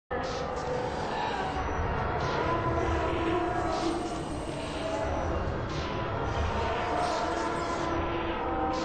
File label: very creepy